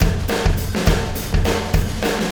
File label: Wormhole Beat, Processed Drumkits; Sonic Foundry (Sony Creative Software); Zero Gravity Beats